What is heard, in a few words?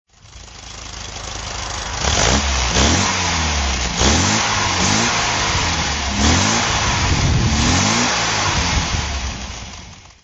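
mgb road throttle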